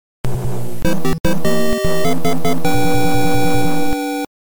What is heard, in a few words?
victory fanfare; Super Mario Bros. 3